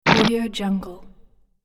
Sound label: quick game splat